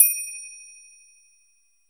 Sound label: TEK BELL; TEK PERC2